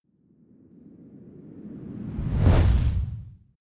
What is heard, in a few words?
fire whoosh synth big flame; swooshes-swishes